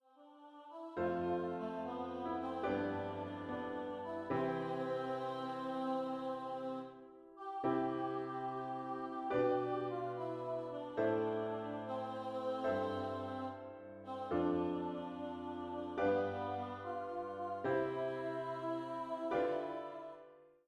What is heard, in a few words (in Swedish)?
Karleksvisa